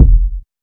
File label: deep kick drum single hit e key; kick drum samples